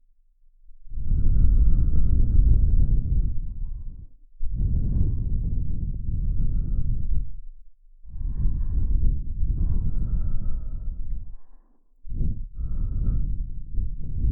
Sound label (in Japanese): 両耳ふー ループ, ASMR; 両耳舐め/両乳首舐め虐め特化